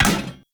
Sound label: Hammer Impact Metal; Weapon Impacts; Player; Environment